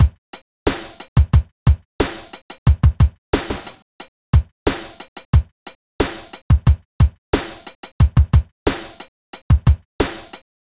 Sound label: Beat, Hip-Hop